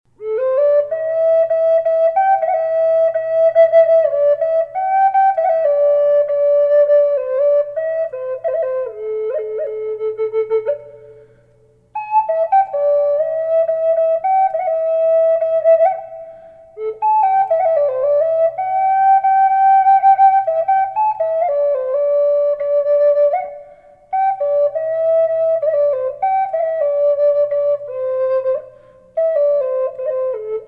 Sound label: squirrel a reverb minor, flutes; collector flutes